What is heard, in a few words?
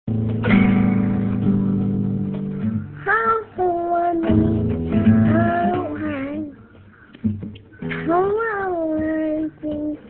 guitar; KCRW; sounds la; niece and guitar